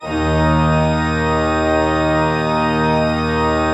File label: D2, Cathedral Organ, PED VOL WERK; Propeller Island